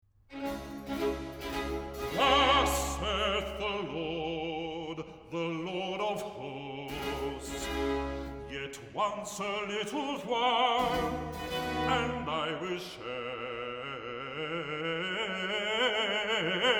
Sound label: Recitative; Messiah